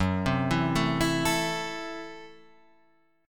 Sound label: A Minor F sharp; Am/F# arpeggiated